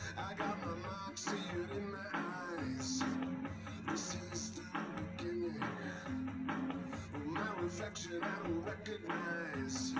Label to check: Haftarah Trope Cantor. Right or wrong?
Wrong